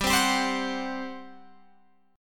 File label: G Major 7th Suspended 4th, G, down, Guitar, Open F; GM7sus4; strummed